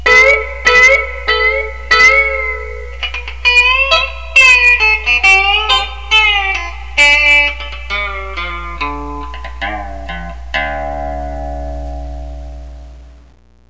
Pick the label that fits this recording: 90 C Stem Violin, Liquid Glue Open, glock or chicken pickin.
chicken pickin